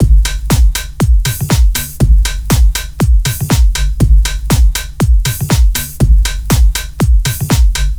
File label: 4 floor beat, trance; Sonic Foundry (Sony Creative Software); Trance NRG VOL-2; Drums; Complete 4 On The Floor